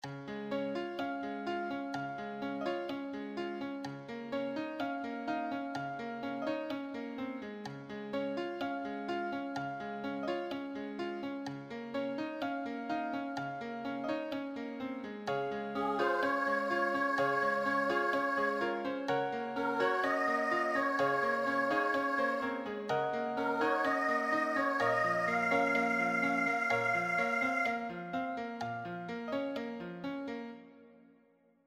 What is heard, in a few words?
accompaniment